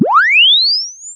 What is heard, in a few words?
Press Two Sides Chirp; Samsung 4 Recordings